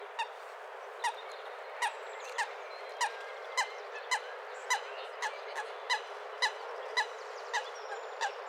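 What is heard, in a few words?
lysuha golos, coot voice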